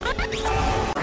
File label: slug launch